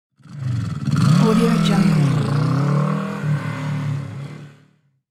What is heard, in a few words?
motorcycle take off